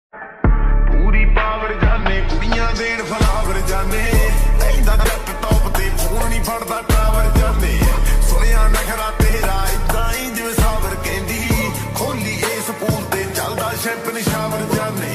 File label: punjabi song ringtone